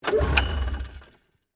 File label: Snowmobile Pull, Sports, Snowmobile, Pull, No, Start, Yamaha, Exciter, 440; Miscellaneous Sport